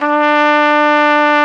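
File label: FLUGELHORN D; Best Service; Alpine Volksmusik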